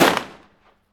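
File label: PERCUSSION PISTOL, Guns Tuned; Roland L-CD701; Guns & Glass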